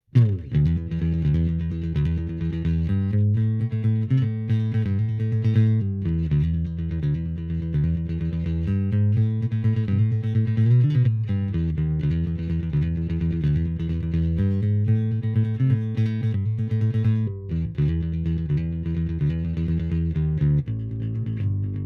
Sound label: Mikro Rickenbacker